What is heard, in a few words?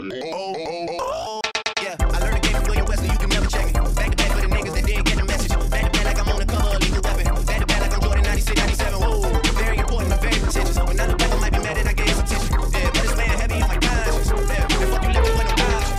funny rap mashup